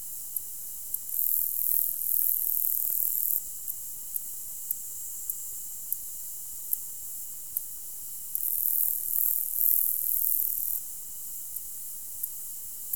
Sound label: short-winged meadow katydid; Insects